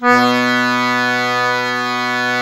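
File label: diatonic accordion B1; USB Soundscan vol.23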